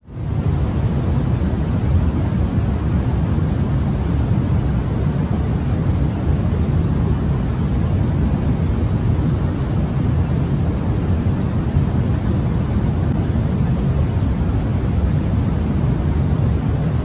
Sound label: Lullaby White Noise